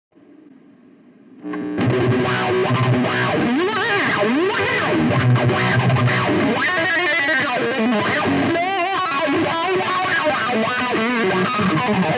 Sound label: wylde wah